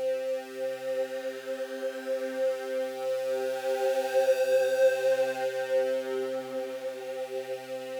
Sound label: Drone; stereo toolkit samples; Non Tempo Loops